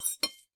Take fork; miside demo windows gamerip 2023

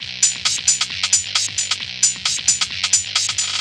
Radio Loop; The Kitchen Killer; Ballistics

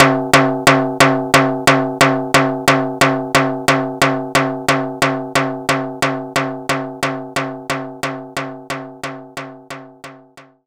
Timbale Low

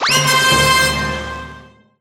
(Sfx) Combo

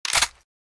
Scar Bolt back; Criminality

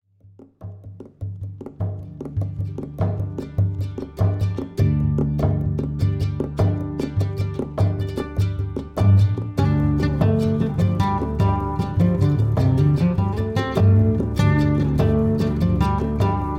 Tavern; dungeons dragonsonline; DDO

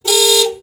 Car Honk

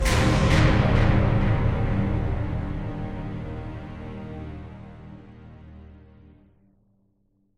Music Stingers stinger Combat End; Hunted: The Demon's Forge; x360

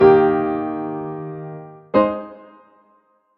Piano

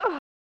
Injured (Female); prototype; snes; steven seagal is the final option

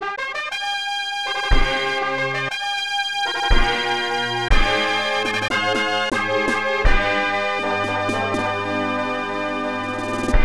Race Start Fanfare

